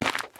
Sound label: Cave Footstep; The Inn